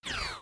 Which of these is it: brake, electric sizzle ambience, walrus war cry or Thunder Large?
brake